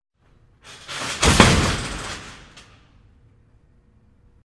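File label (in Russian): падение со звуком мягкой металлической решетки; Звуки падения человека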